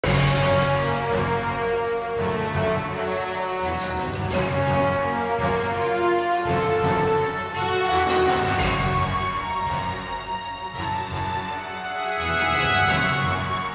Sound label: Tension; Morbius Tension #1; Spider-Man 3